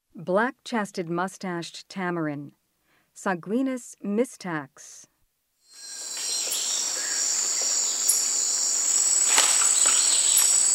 Black-chested mustached tamarin, Primates of the Rainforest; S. America